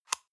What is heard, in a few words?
paper box small close medium; objekte